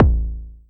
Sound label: Tempest 808 Style Kick